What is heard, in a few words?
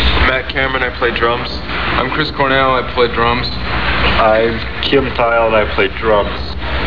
drums